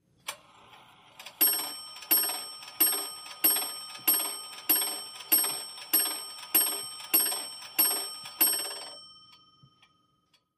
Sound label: Grandfather Clock Chime